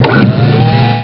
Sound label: DOOR FX; WhalesVoyage2